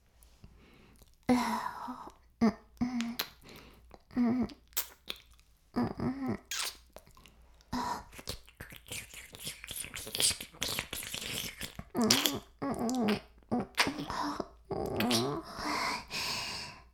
耳舐め音声(LR逆); 白くて黒い魔性円環

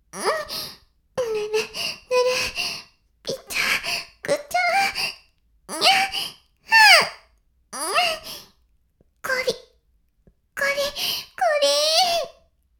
しこしこ音声, ASMR; 白くて黒い魔性円環

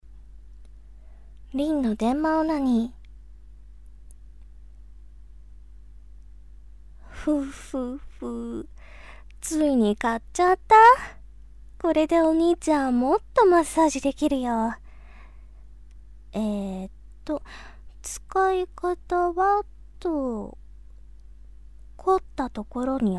生音＆実演 電マオナニー。ボイスのみ

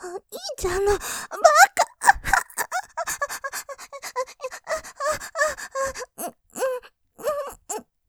喘ぎ お兄ちゃんのばかぁ; ループで楽しむ！シェフの気まぐれ抜き抜きコース

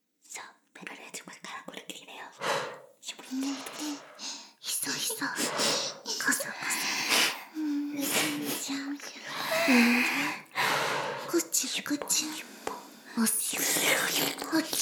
耳舐め＋しこしこ, ASMR; 淫妖精の森

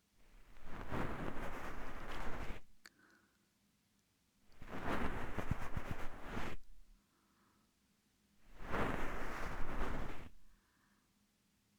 梵天 エンドレス 左, ASMR

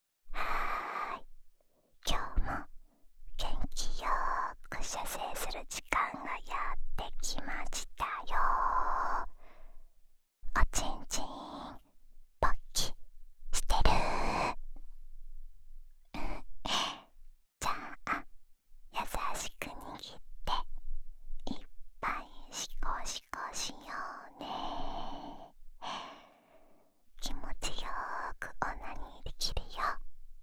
オナサポ用耳舐めループ（左耳）, ASMR

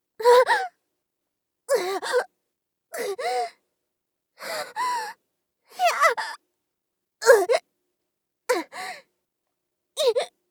ダメージ声(弱); 効果音なし

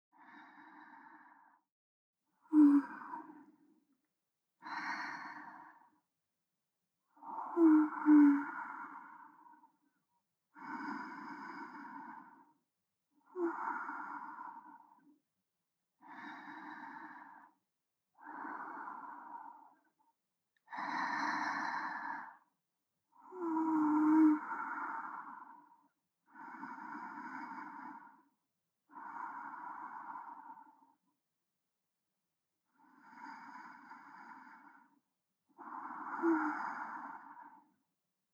添い寝呼吸音 中央; おさなママ

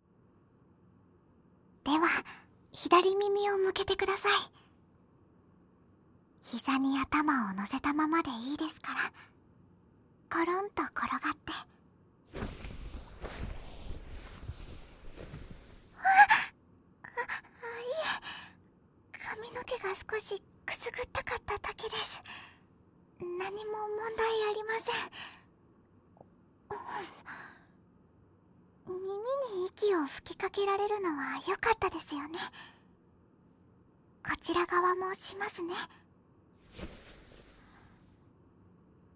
膝枕でお耳吹き＆耳かき（左耳）; いやでんっ! 〜癒やしの寝台列車「クルーズトレイン・ロイヤルセブン」〜; ツンデレ・ドジっ娘

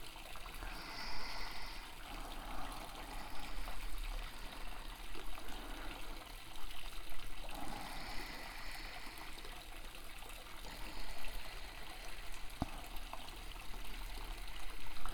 温泉と 寝息; 桃源譚~温泉~; 温泉と綾乃・泉

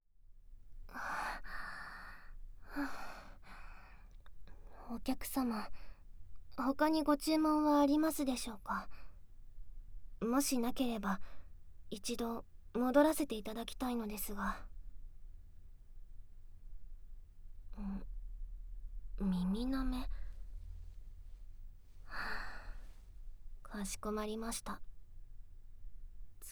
嫌がりながら ぐっぽり耳舐め; 次の注文は耳舐めですか？

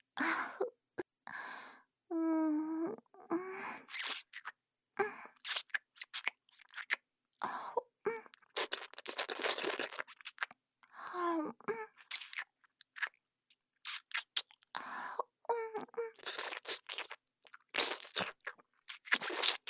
フェラチオちゅぱ音; NTR萌香ちゃん

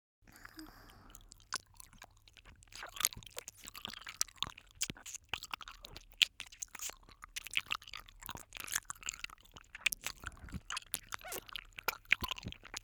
糖分たっぷり両耳舐め, ASMR

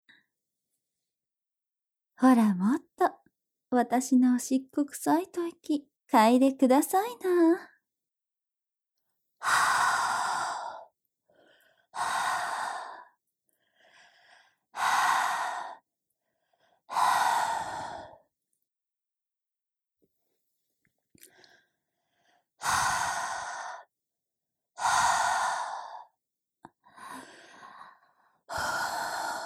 おしっこ臭い吐息

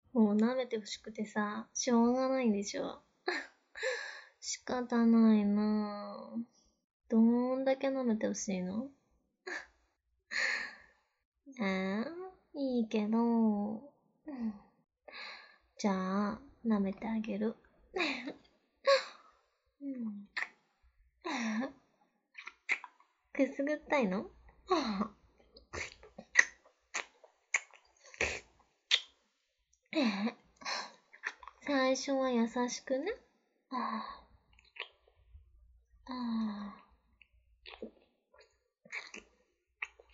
リスナーさんへの擬似フェラ実演; オナニー実演音声

